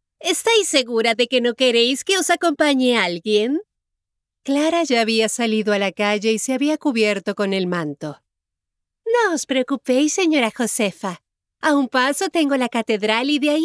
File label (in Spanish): acordes de seda